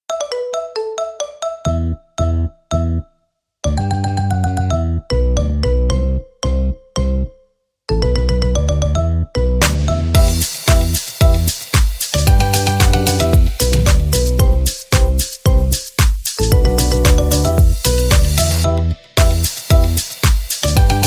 marimba remix

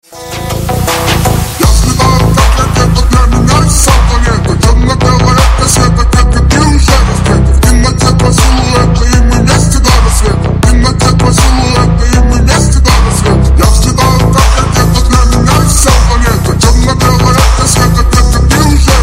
powerful music remix

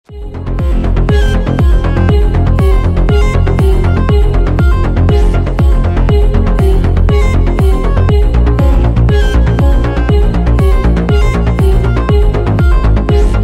epic remix; Squid Game

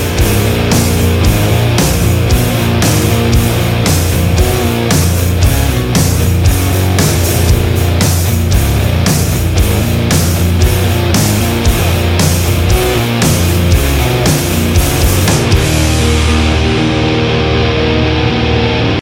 instrumental

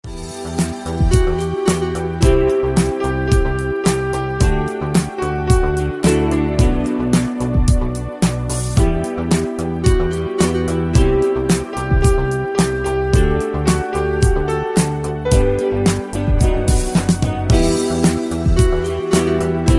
instrumental remix

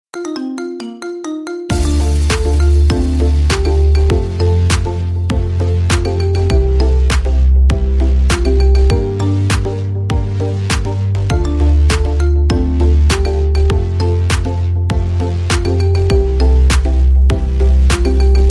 marimba remix

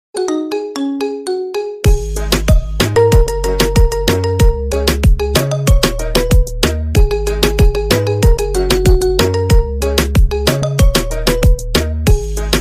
marimba remix

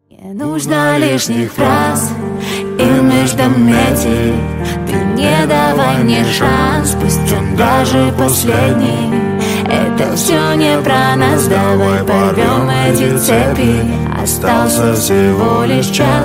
acoustic version

